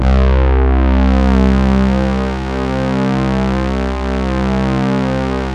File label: JV GR LEAD, JV-1080; Syntec; Wall of Sounds